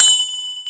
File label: ring